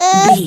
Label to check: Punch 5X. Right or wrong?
Wrong